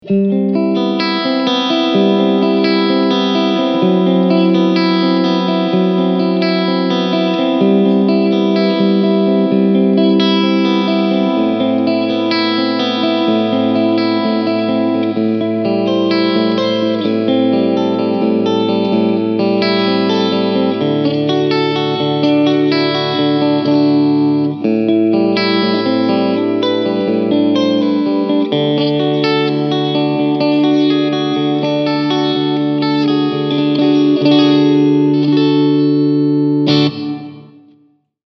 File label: Blackstar Silverline Special Clean Warm EL34 Reverb